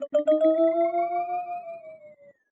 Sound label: Musicbox